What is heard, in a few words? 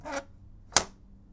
Open Close, paper cutter; Micro Pack